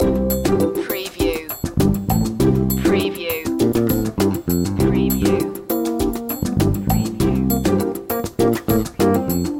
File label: beat vouyer loop